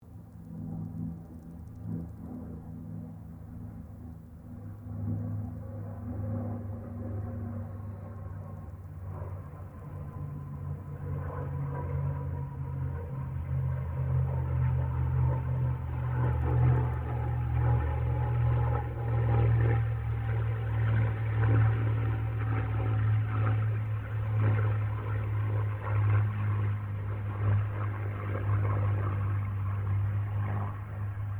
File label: AIRCRAFT CAC CA-18 MUSTANG MK23 PASS DISTANT; CA-18 /P-51 Mustang; Airplanes; Vehicles; Aircraft Collection; Twistedtracks